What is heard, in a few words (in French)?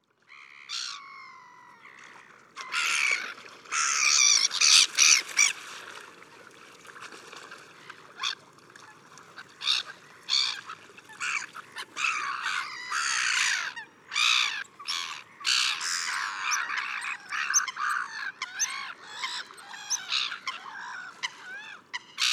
le bal des mouettes